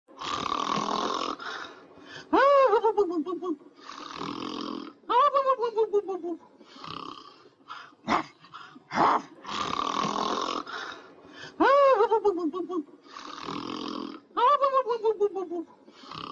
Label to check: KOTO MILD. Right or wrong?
Wrong